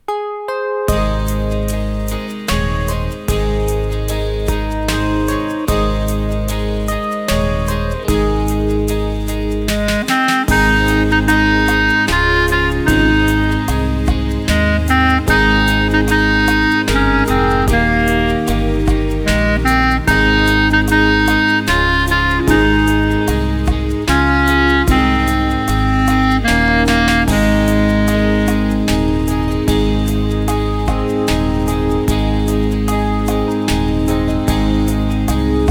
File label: Clarinet to listen; Kumbaya